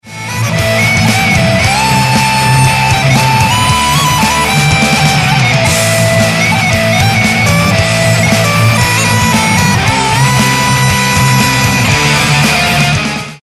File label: pipe; uilleann pipes dans un passage moins calme